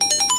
phone; gta v

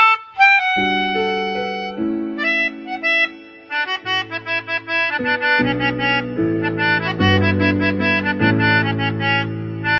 melodica

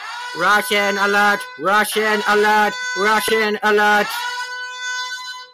russian alert

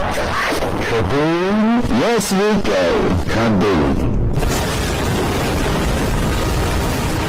kaboom loud; yes rico

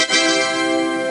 old winning sound effect; roblox

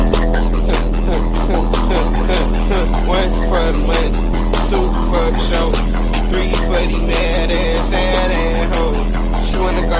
bass boosted

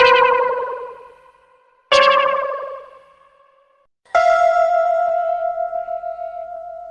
awkward pause; anime sounds

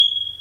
smoke detector beep

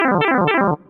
mario power down

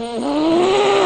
angry sound effect; roblox

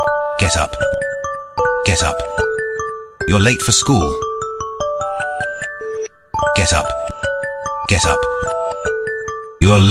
alarm; samsung; mafioso

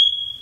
fire alarm chirp

